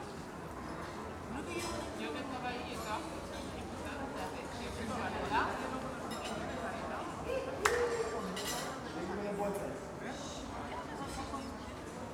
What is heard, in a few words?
Walla, People, Restaurants, Wind, City Presence, Traffic, Plates, Cutle, COSTAL SPAIN; Cutlery; PMSFX